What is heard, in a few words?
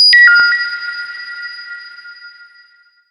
SHORT, SHORTNOIS; AKAI; USB Soundscan vol.14 - Special FX Twisted